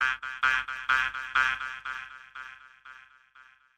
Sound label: Male Cricket